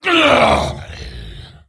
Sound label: Wound Crit; General Vezax